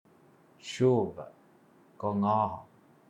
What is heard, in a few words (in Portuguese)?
chuva